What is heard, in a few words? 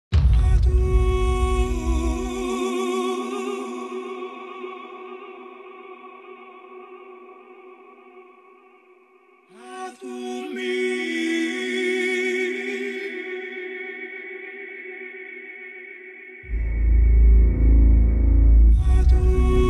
instrumental, persian